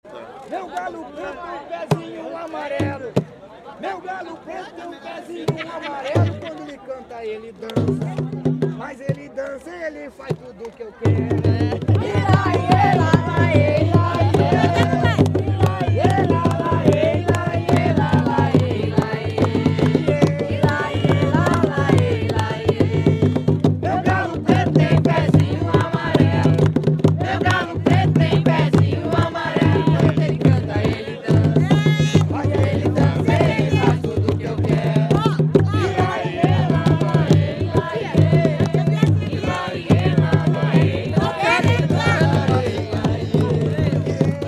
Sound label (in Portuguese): jongo do tamandare galo preto